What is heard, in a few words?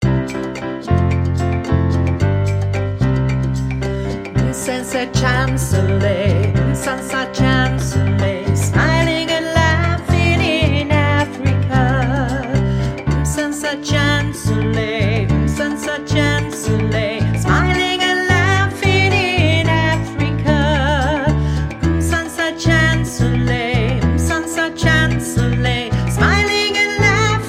Mmmm Sansa Backing